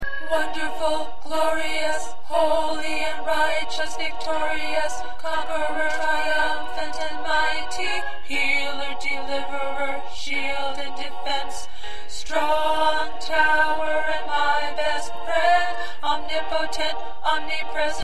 1st Tenor

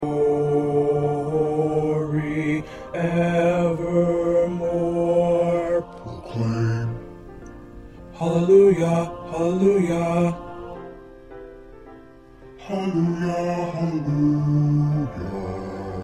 2nd Bass

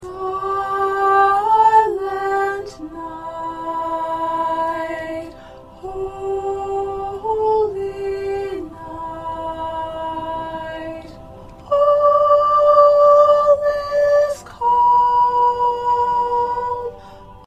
Soprano

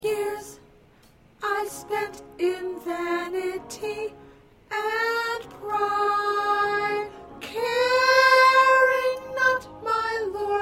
Soprano

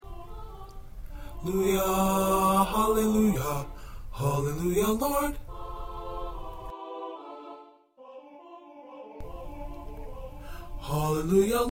Bass

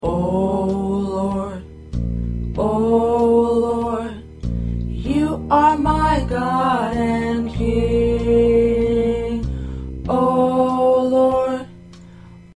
P Tenor

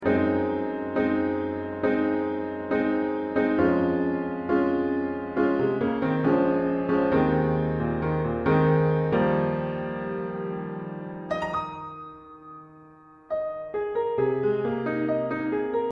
Piano

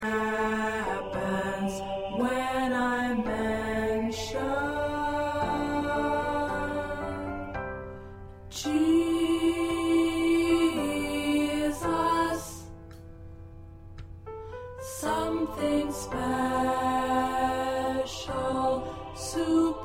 Tenor

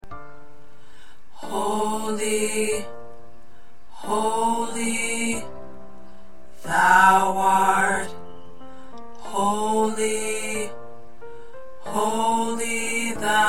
Alto, choir parts

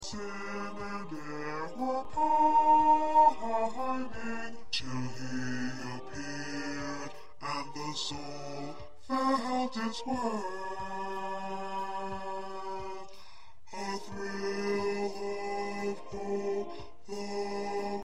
Bass

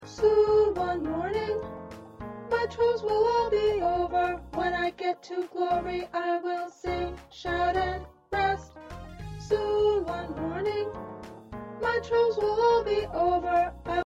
Alto

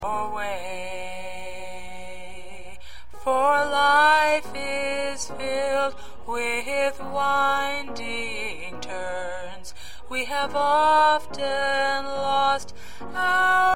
Lead Tenor

